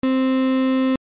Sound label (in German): rechteck, synthesizer